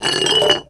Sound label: JAR QUICK, rhythmic hits; Rarefaction; Poke in The Ear With A Sharp Stick